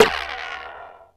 RAIL BUZ; Rarefaction; Poke in The Ear With A Sharp Stick

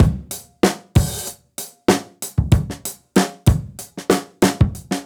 Beat 95, dusty funk; musicradar; Beats; 95bpm

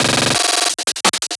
Dizzy Fill, Loops, breaks; m8 breaks

